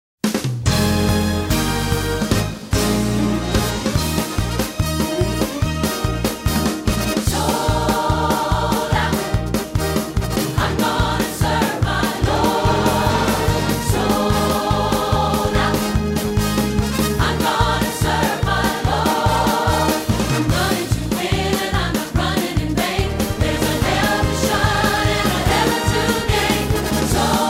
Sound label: Melody